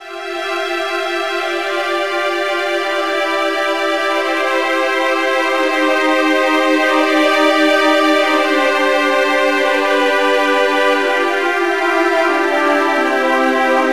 Game Over

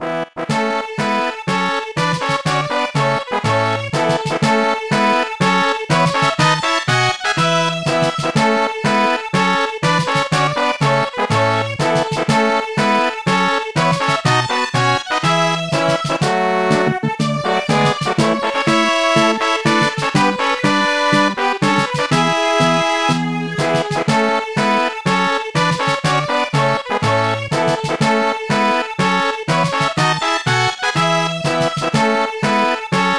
Victory Fanfare

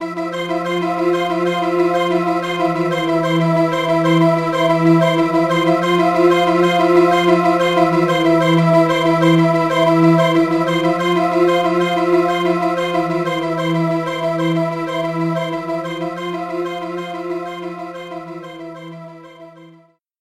White Magic

